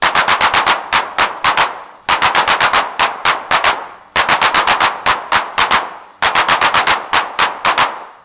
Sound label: RINGTONE